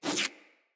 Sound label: Root Spider Web Shot Proj Whiz; Animals; Spiders; Abilities